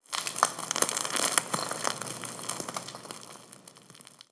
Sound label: post grenade dirt debris; physics, explosion